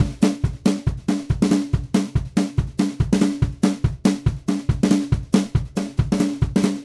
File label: Country Drums Verse; Verse 1; Digidesign; Pro Tools Essential Loops